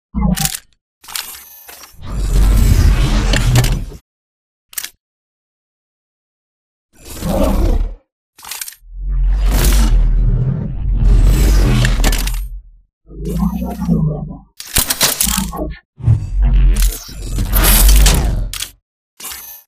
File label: Casual Idle; Titanfall 2